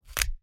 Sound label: Bone Crack; SoundsCrate